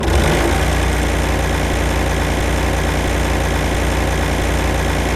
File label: V6T starter; AOS F1 2022